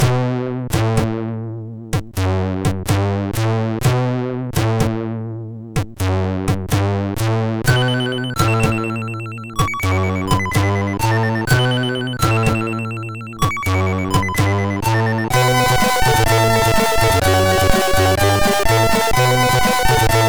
tune, sid MOS6581R3

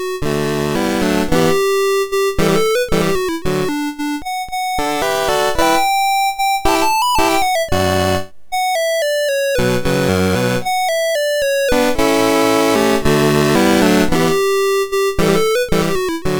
Bagatelle, MOS6581R3

